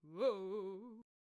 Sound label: Attack Vocals; Candlehead